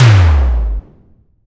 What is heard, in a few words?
boom